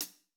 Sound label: pop hihat; japanese pop